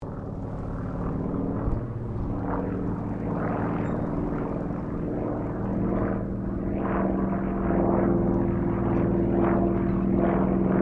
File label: 1979 YAKOVLEV YAK-52 FLYING GENERAL, Russian Yak 52 Training aircraft; Airplanes; Vehicles